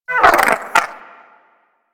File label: Wyrm Idle, Vocals; Warframe; Pets